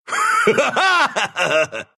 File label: laugh, ru